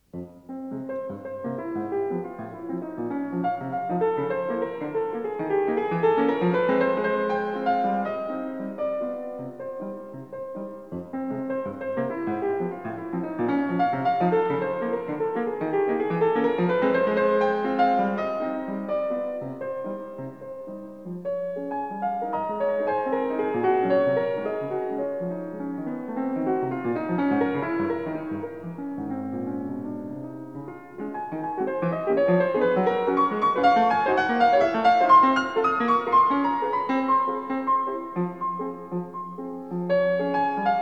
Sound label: piano sonata 3 in f minor